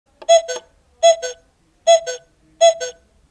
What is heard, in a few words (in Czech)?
melodie